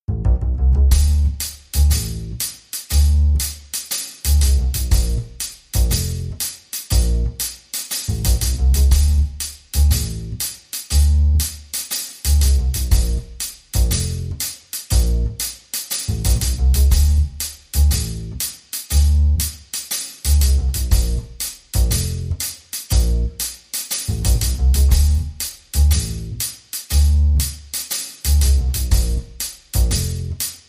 Drum & Bass

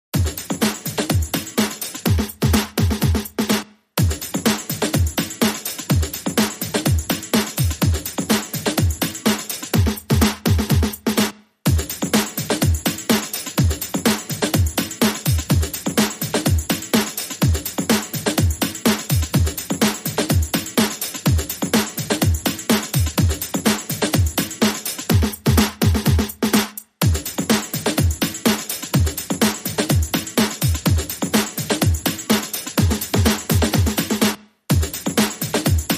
Drums Only

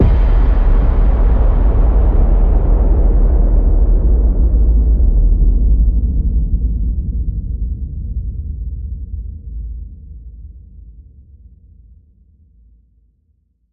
Hit Low Dropped

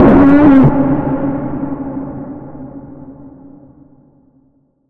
Bend, C sharp

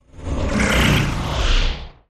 Big Heavy Flutter, Taylor Grand

